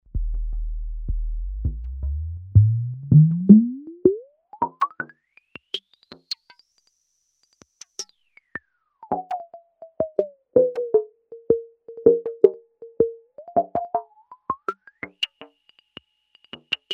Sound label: Peak FM; Mr Hyde